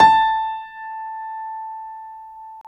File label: Piano Grand; CMI